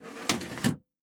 SFX case Attach Metal; PC Building Simulator

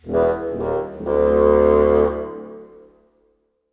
Frog Miss; king s quest chapter 1 a knight to remember gamerip

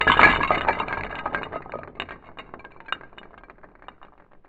Killing Blow Even Slower; lego ninjago rise of the snakes

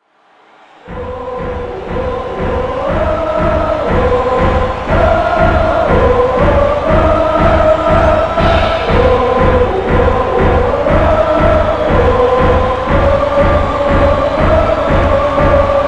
Chants; Senro

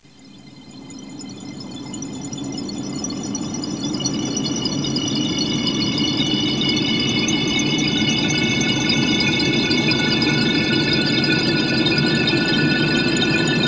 Pad; Great Eagle 2

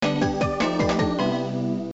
Game Over; Scud Hammer

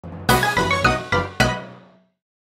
All Medals Jingle

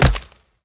hit bone; Rapids; scrat hit bone

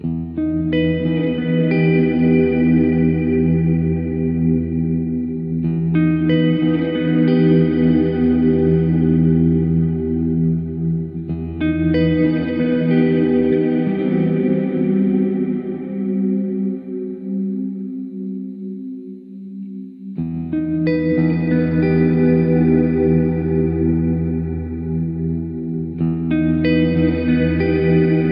Shelter

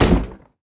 Load; Level16 Bertha

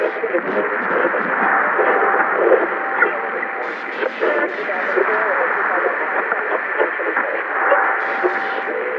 radar ambience; gamerip; shattered horizon 2009

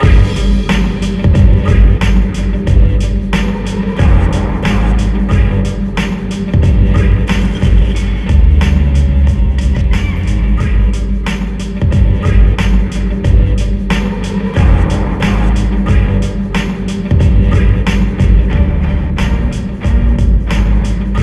Urban Radio Inside Close

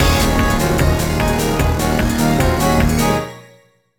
BGM STAGE BATTLE; Phantasy Star Zero

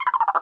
Nature Minimize; windows xp windows gamerip 2001

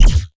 Sci-Fi Weapons Laser Shot

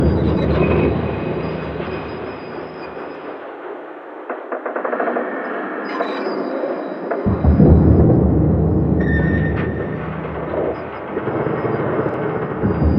metal screech

